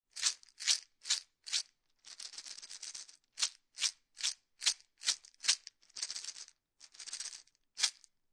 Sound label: Jar With Beads Many Shakes, Foley, Jar, Small Plastic Jar Filled With Beads, Several Shakes; Shaking